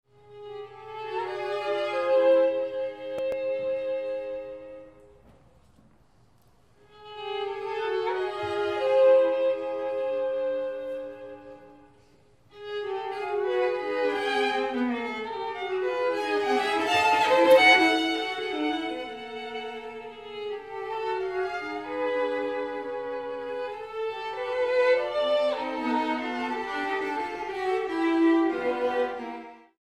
String Trio; CHAMBER WORKS

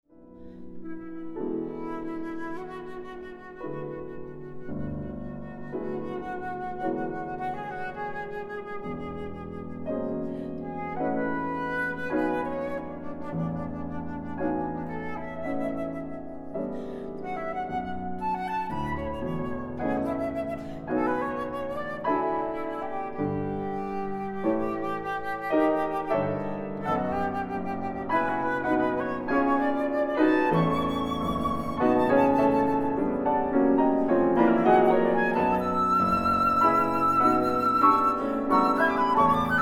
Flute Poetic; CHAMBER WORKS

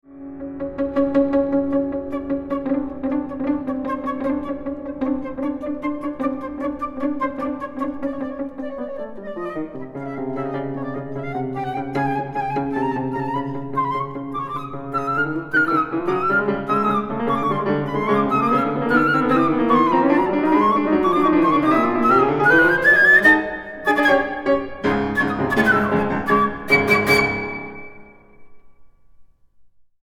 Flute Poetic; CHAMBER WORKS

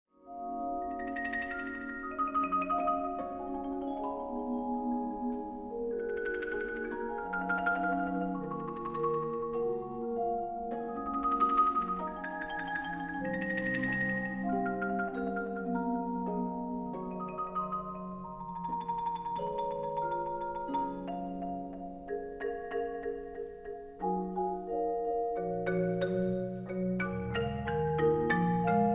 Percussion Concerto Orch; ORCHESTRAL WORKS

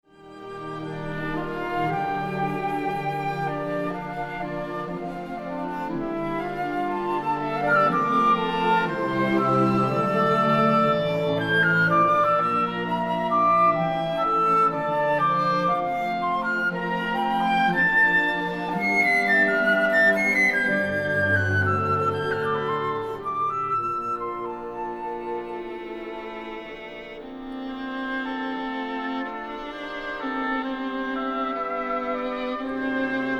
Vln Conc Chaconni; orchestral works

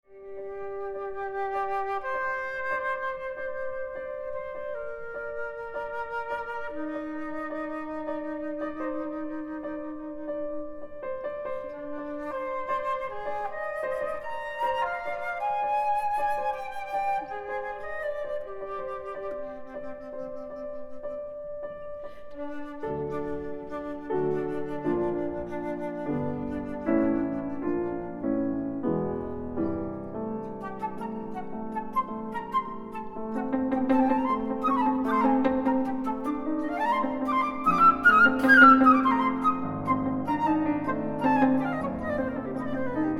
Flute Poetic; CHAMBER WORKS

